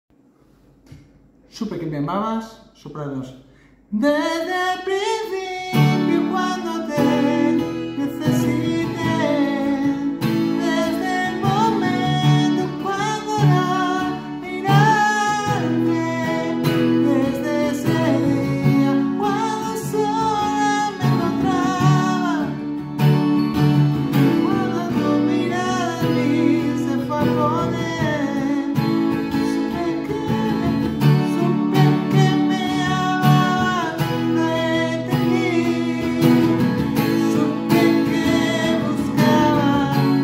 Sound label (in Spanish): supe que me amabas soprano